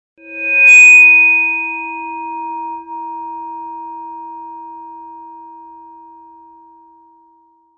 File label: microphone feedback; Sound FX Real Life